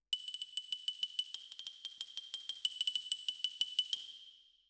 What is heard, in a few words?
Xylo